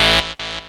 saw lead synth

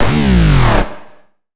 fat growl, bass

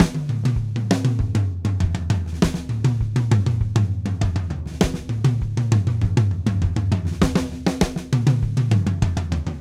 Drumset Groove; Sonic Foundry (Sony Creative Software); Joe Vitale Latin Percussion; Grooves

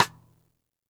xstick snares on; JazzFunkKit

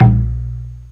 PERC, PERCS KIT

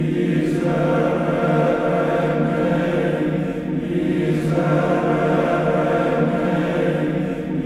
MISERERE, Symphony of Voices

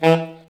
SAX A. STAB, Alto Section; Roland L-CD702

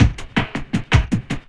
LOOP, 160 BPM, Drum & Bass; Ueberschall; Drum & Bass Resonance

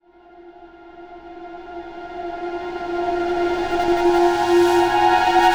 Can Can Swell; KJ Sawka - Drum n Bass & Beyond; Swells

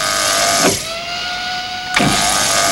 HYDRO DOOR; Northstar; The Wizard VOL-1

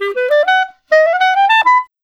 Clarinet

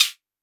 vermona drm1 mk3 hh

